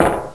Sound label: footstep metal